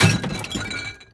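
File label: crate cocktail; bedna koktejl; Mafie 1 cz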